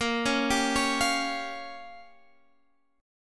A sharp Minor 6th A sharp down Guitar Standard, chord; arpeggiated